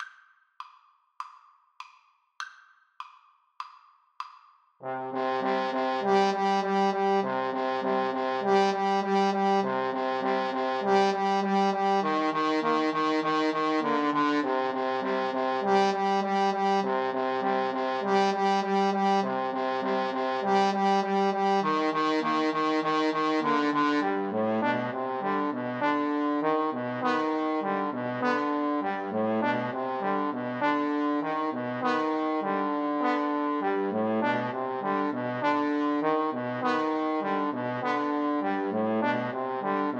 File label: mountain king, Trombone Trio